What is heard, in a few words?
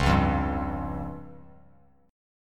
Db Major Flat 5th, Bass Standard; strummed; JGuitar